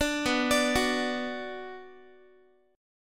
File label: B-Minor, Guitar; arpeggiated; JGuitar